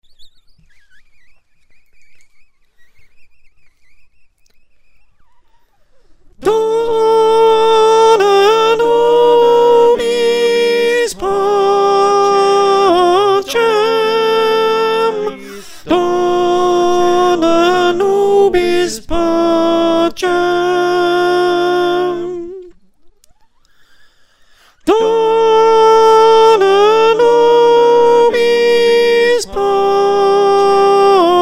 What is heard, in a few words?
Soprano, choir